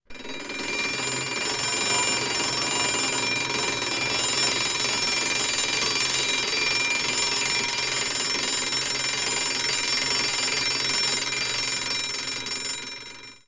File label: Alarm Clock Classic Bell